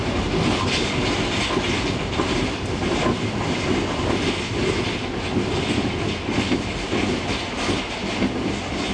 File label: spring, train; OpenBVE; 2022 HQ R110B